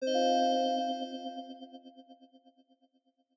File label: Clear Em7 Low